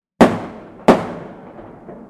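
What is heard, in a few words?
sound of firecracker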